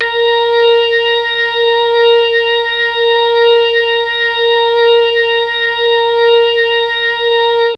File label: A#3 ORGAN, Mellotron MKII ORGAN; Mike Pinder - Mellotron Archive CD